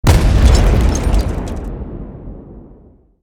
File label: skill explode debris